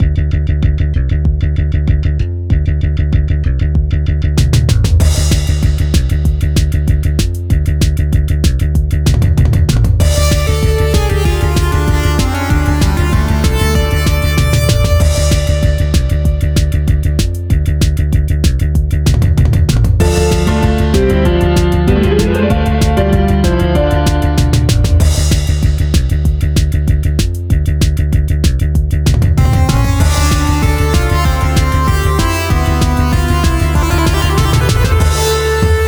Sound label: battle theme